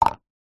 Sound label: household cup plastic empty set down table; zapsplat